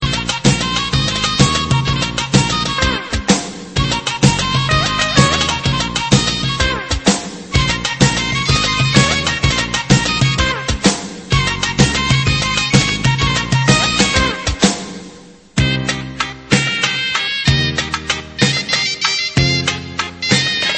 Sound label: Instrumental